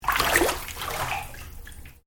Water Bath Spa Movement; Domestic water movement; Nature

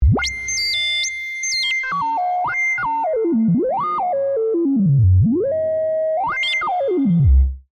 Digital Oscillator, Search, Radio, Communicator; Science, Fiction; Tech Sound Effects; BlastWave

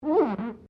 GLASS SQUEAK FINGER

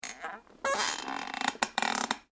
METAL CREAK; Materials

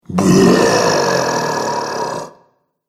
Zombie, Zombie Sound Effects; horror

